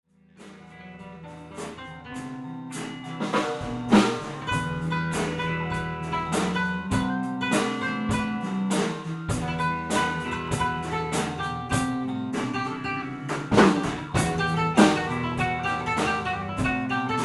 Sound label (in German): mit Tambour & Didgeridoo